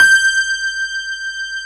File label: PIANO G5; AKAI S-Series CD-ROM Sound Library VOL-2; MEGAPIANO